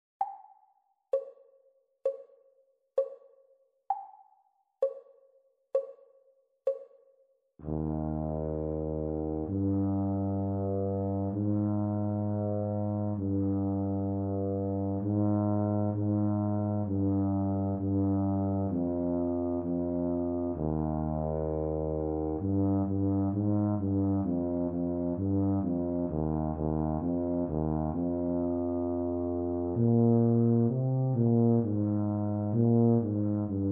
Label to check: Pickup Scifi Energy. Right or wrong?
Wrong